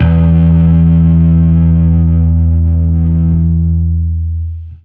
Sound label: Fuzz E harm; Torchlight III; PS4